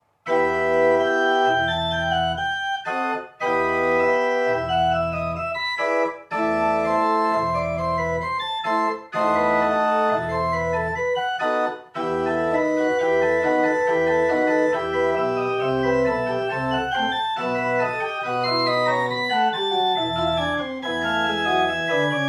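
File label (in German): Schulte, pfeifenorgeln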